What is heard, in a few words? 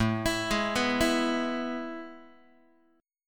A Major 7th Suspended 2nd, Guitar; AM7sus2 arpeggiated; x,5,7,6,3,3; JGuitar